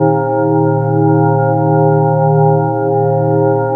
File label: A#3, TURBOBELLS; Northstar; The Wizard VOL-1